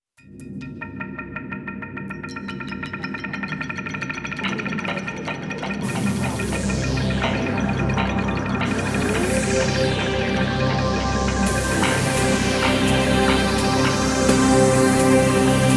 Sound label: courtroom lounge